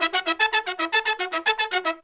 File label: A, Pop & Funk Brass; Best Service ProSamples vol.25; AKAI